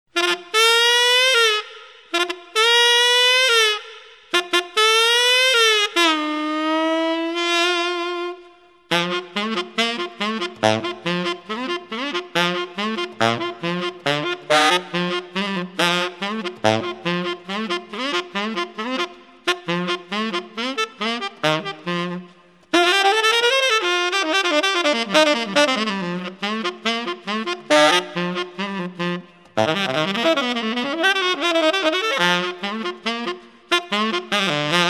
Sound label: TENOR SAXOPHONE